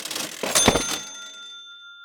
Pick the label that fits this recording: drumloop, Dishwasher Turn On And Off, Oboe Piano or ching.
ching